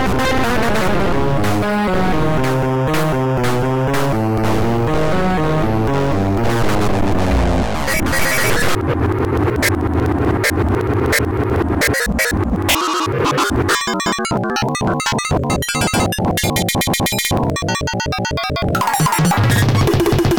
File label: noiseparade